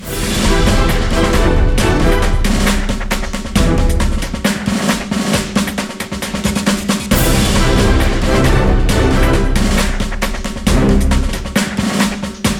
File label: bgm battle; witch spring 3 android ios mobile gamerip 2017